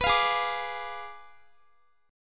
Ab Diminished 7th Ab, Guitar, 4E, 4A, 5D, 5G, 5C, 6F; Abdim7; strummed; x,x,21,22,20,21